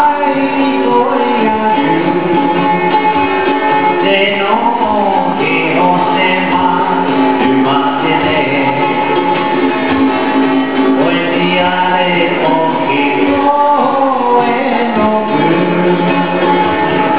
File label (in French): chanson, tahiti